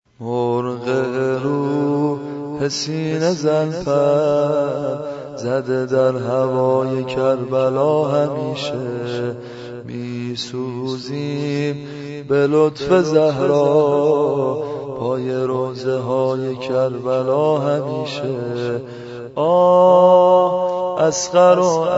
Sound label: sine zan; moharam; Nohe